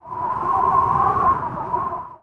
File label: vent wind